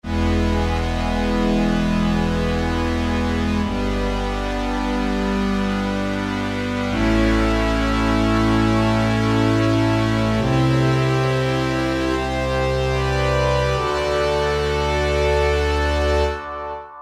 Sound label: Fat & Warm Pads; Greytsounds Philip Wolfe's Rock Keyboards